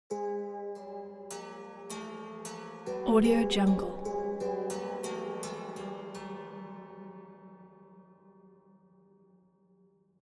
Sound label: musical horror haunted strings chromatic sequence